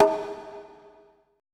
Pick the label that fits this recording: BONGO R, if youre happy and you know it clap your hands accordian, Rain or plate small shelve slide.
BONGO R